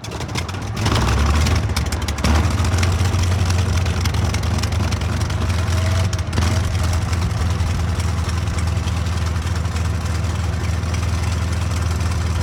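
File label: Plane P40 Interior Start Loop; Airplanes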